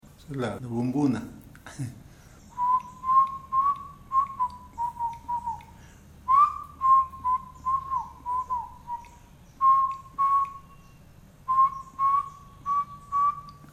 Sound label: Grillo La Bumbuna Bird Call